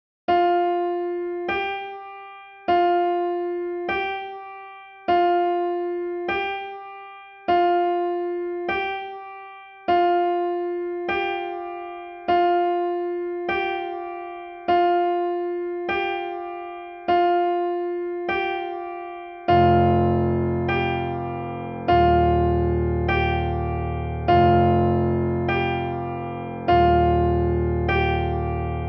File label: Syntonic Comma Demo